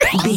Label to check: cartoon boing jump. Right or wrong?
Right